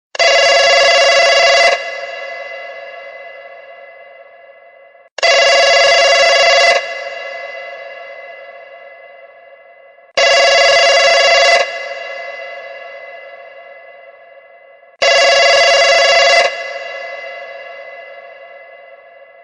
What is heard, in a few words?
Ring Echo